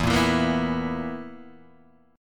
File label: B Major 7th Suspended 4th E